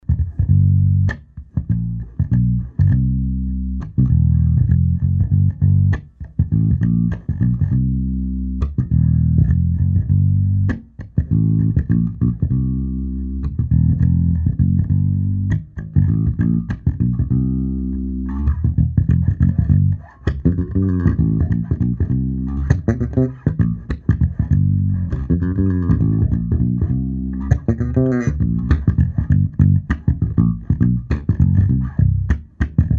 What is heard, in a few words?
Bass Torch, amp